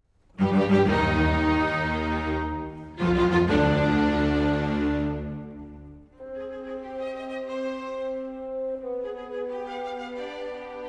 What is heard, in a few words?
piano